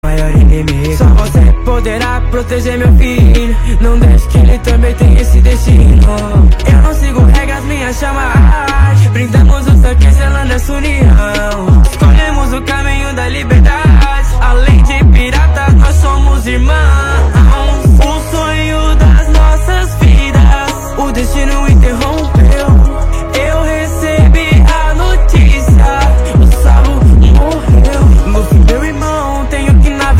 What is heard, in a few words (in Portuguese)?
Rap